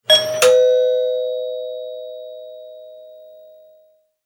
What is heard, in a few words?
doorbell ding dong sound effect